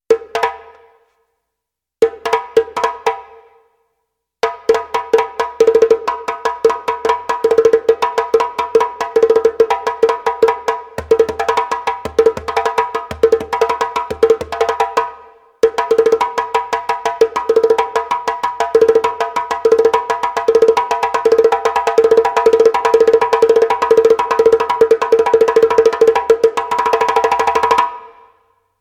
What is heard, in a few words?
Djembe